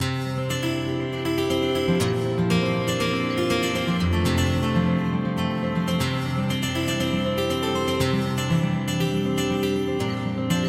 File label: Gated reverb